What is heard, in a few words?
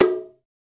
shime hi, Japanese Drums; ETHNIC and WORLD PERCUSSION